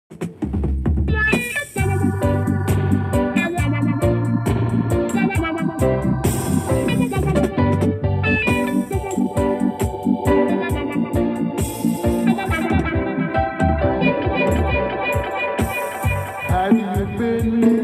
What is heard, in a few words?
Words Sounds Dubwise